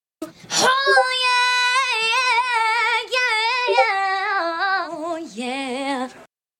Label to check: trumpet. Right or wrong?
Wrong